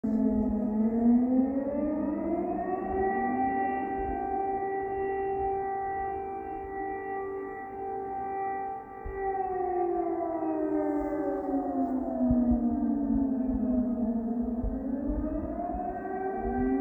Air Raid

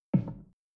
Footstep

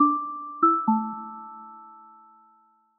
Soft Short App Melody

